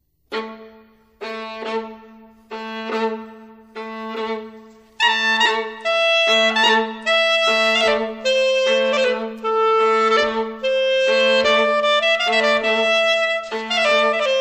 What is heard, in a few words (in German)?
Violine Klarinette